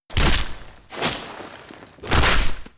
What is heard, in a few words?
CRUNCH